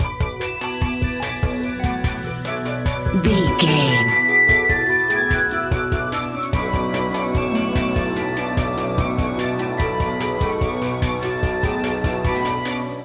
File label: video game title screen loop